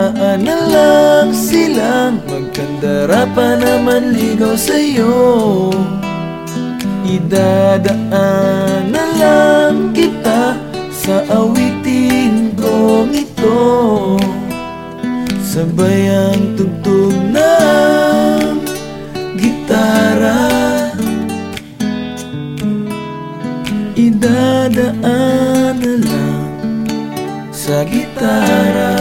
Gitara

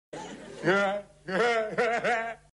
Smiech

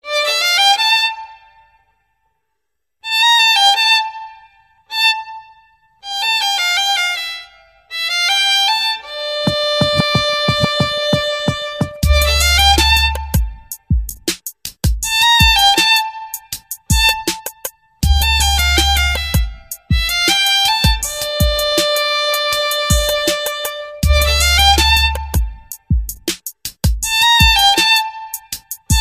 Skrzypce

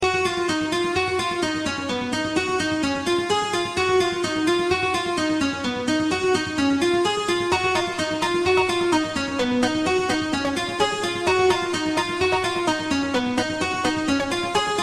Fajny Dzwonek

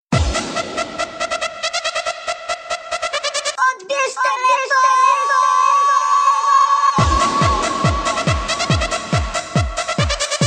Techno dzwonek

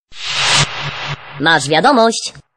Wiadomosc

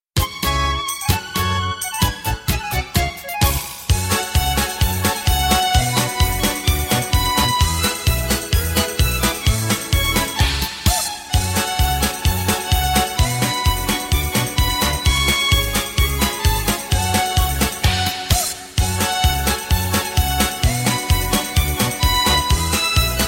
Skrzypce Cygana

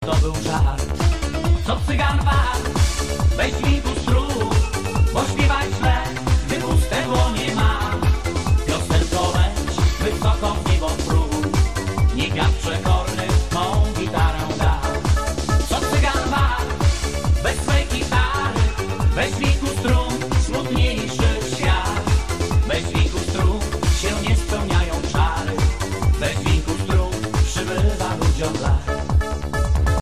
Dzwieki strun

